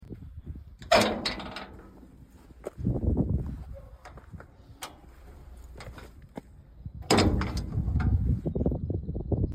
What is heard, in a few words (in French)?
ENTREE, BRUITS DE PORTAILS; SALEIGNES (17)